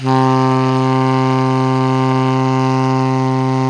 TENOR PP C 3, Saxophones; Greytsounds; Philip Wolfe David Crozier's Saxophones

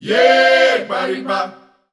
F 100, Voices Of Africa Sung Phrases; Ye Paripa

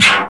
PERC FX, SYNTH PER; AKAI S6000 CD-ROM - Volume 1; PERCUSSION

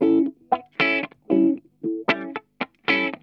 CROSSING, Funk Guitar; Zero G - Funk Guitar

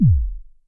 BD Rave, Electric Kick, Yamaha DTXpress; drum machine

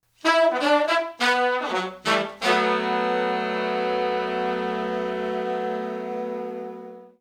Soul Horns, 70s Analog Funk

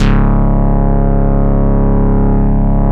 Synth Bass, Analog Bass

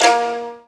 COL LEG G3, STRINGED; East West; Global Sounds

